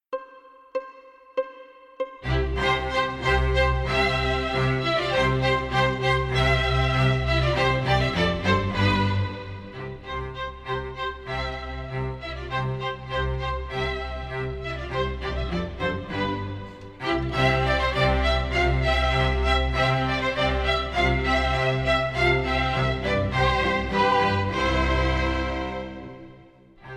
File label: piano